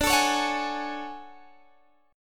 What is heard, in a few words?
D Major Sharp 11th D Guitar Standard; strummed; JGuitar